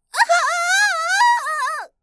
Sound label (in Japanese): あ゛あああぁぁぁっ……（えぐめ、重傷、死亡）